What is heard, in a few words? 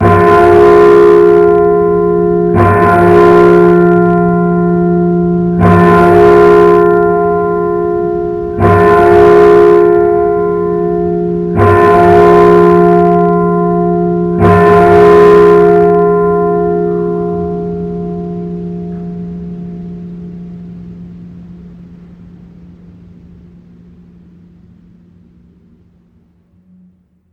cathedral bell